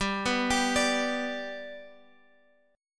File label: G Major G down Guitar Open G